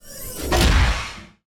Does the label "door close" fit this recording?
Yes